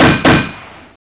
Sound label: dash start, scorpion